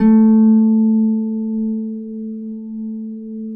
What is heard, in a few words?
A2 HARP; Complete Harps; USB Soundscan vol.62; AKAI